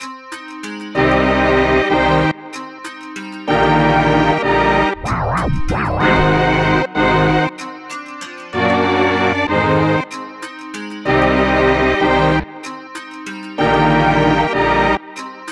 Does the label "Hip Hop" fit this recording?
Yes